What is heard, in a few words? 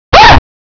Scream